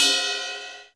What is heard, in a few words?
CY RD, 60S KITS; 90 sSampleCDs; Crypton - DrumBase5000